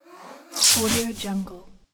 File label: fast whoosh